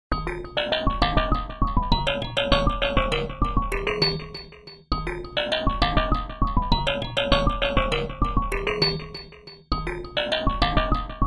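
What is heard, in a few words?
Metal brush, Chromatic hits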